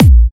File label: Trance Kicks